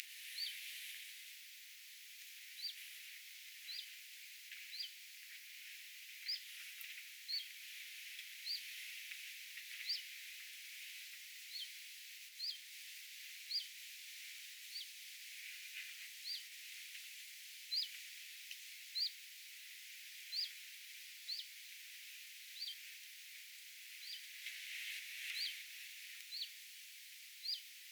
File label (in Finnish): vif-viy-tiltaltti nuori vuoritiltaltti